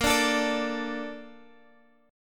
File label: A sharp Major 7th, Guitar, Half Step Down